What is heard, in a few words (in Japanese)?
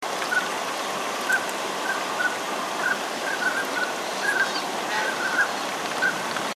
onagagamo